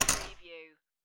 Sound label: Poker Chips Drop Two Chips On Wood Table